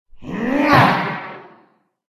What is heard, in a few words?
mage boss attack voice middle